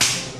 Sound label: hit; freak fortress 2; aniki